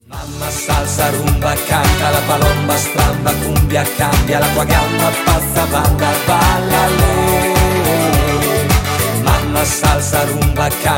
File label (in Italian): cumbia stramba